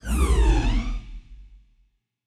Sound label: Spaceship FX